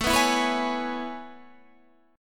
C sharp Minor 7th A; C#m7/A; strummed

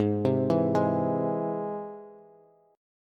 Ab Augmented Ab Bass; Ab+ arpeggiated; JGuitar

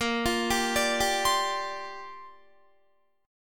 A sharp 6th Add 9th, Guitar; A#6add9; arpeggiated; 18,20,17,19,19,19

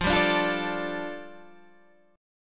A 7th Suspended 4th Sharp 5th, F

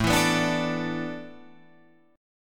A Suspended 2nd Suspended 4th, Guitar, Open F; Asus2sus4 strummed

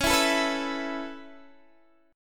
Db Minor 7th Sharp 5th, Guitar, Open D

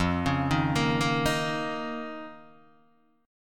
F 7th Suspended 4th Sharp 5th F, Guitar, DADGAD

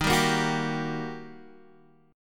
G Minor Major 7th Sharp 5th, D sharp

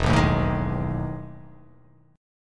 E Major 11th E down; EM11 strummed; JGuitar

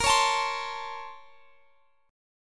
A sharp Minor Major 9th, down

